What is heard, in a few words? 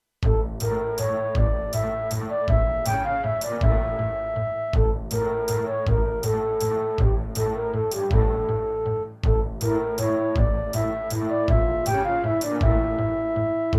Gravis UltraSound Extreme